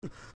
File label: Sneeze; Adult Link; Link sneezing (4 parts)